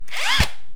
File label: fooley; M8; percussion and foley